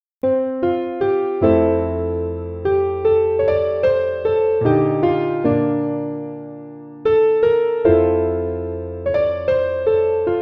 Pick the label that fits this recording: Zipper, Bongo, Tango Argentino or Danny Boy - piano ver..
Danny Boy - piano ver.